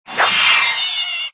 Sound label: ANIMAL CREATURE